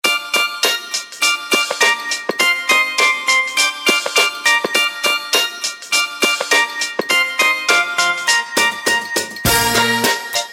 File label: Mama mi kupi dnes (instrumental)